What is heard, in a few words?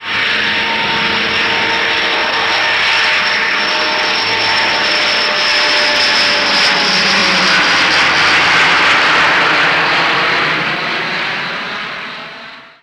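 vickers viscount, aircraft; robin pinnock; simutrans; Pak128.Britain-Ex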